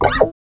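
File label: GUI Rutsch rauf, acoustic guitar; Best Service Gigapack 2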